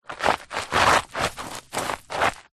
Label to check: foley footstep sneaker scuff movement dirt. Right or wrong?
Right